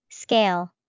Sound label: scale